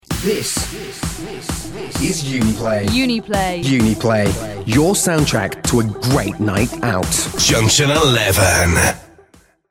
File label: jingle; uniplay